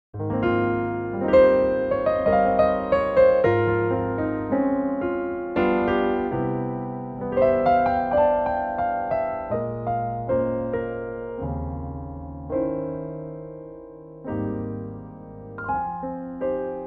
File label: piano